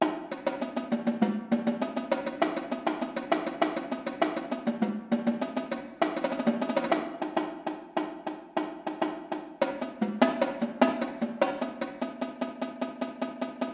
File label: drumline tenors